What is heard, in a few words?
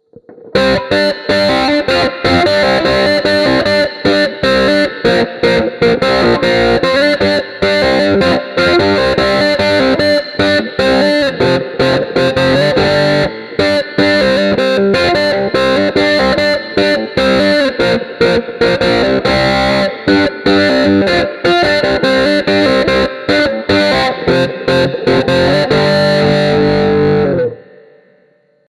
Amplitube Max Wha